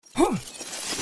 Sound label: Boy Normal Heal Cure; Goddess of Trampling